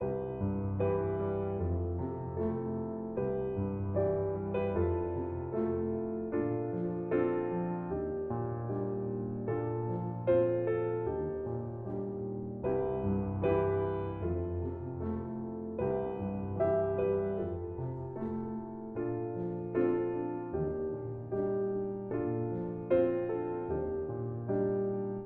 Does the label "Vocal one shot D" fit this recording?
No